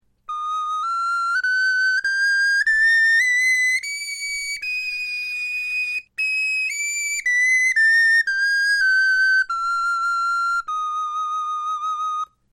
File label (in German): tonleiter, knochenfloeten